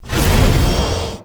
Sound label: Hammer Throw Power Up; Warframe; Veilbreaker; Sprag